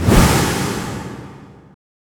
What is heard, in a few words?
spear cast; weapons; dota; mars